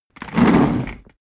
office chair worn trundle hard

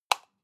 light switch off hard

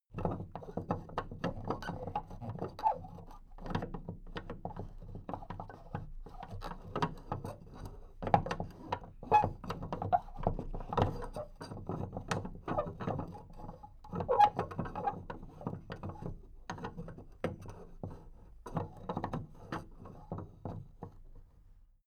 big plastic case movement handle

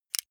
lighter electric release

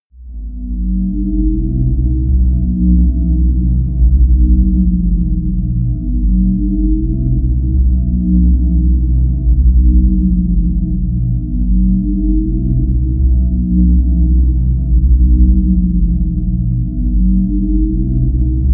drone nation

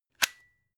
plug unplug single

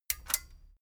metal button stereo click disable medium

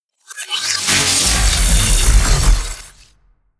disintegrate

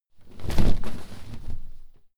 clothes low hit drop

